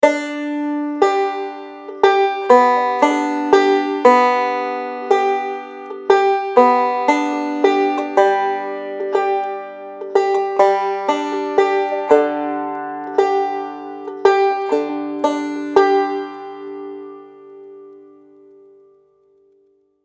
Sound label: Double Lick Roll